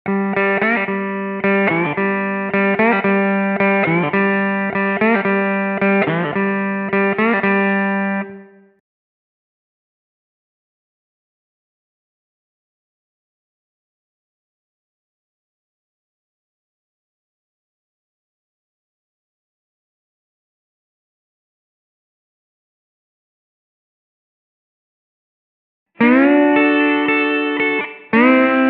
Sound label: Slide Guitar 110 BPM In G